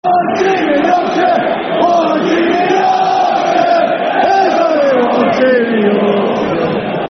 One Team In Yorkshire, Football Chant (Soccer Song); Doncaster Rovers Drfc